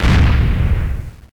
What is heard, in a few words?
boom; bombermania